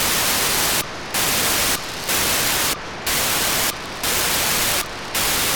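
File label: Amursat 436MHz250 4k8 Mobitex beacon, satellite; On October 3rd 2022 at 14:15 UTC Daniel DL7NDR received on 436.250 MHz the 4800bd MOBITEX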